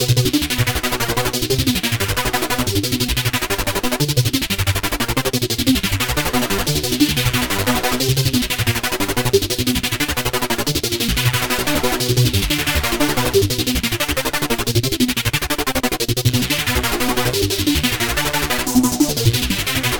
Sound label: Rave On 180bpm